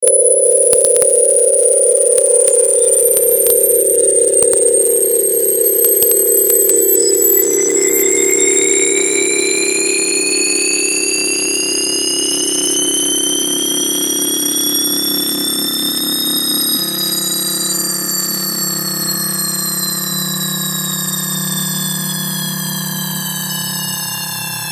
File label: sine2 sine4x; spectral